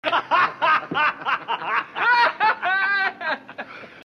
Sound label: laughter